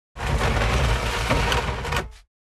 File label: Heavy furniture moving and sliding